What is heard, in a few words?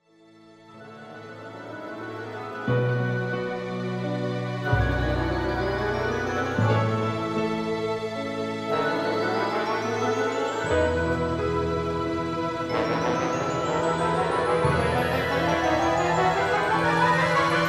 Waltz; Beanstalk